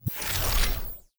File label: ui computer text delete; user interface